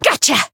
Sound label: Voice attack